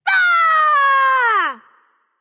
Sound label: battle cry; smite; persephone